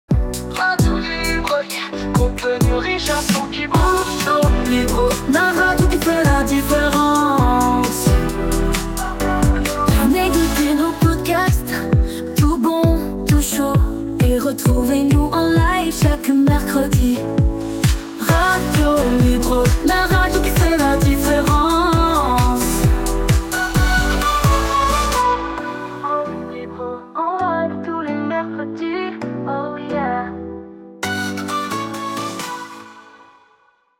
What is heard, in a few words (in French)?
Jingles Radio Chantes RnB short; Radio Libre